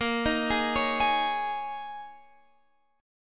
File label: G sharp Suspended 2nd Suspended 4th, guitar chord